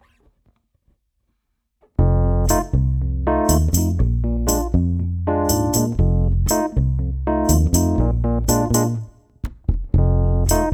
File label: Chat Bada Instrumental